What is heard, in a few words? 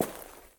dirt step; Golden Crayon Sounds